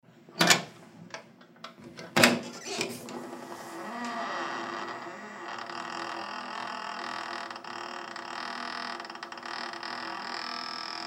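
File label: balcony door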